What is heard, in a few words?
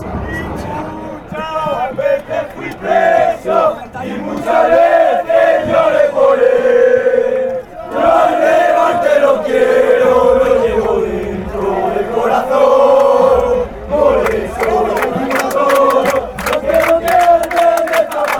heart fanchants